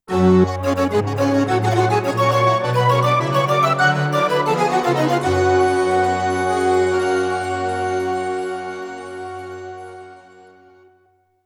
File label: Magical Sound Logo (SC-55); Fatal Fury 2; x68000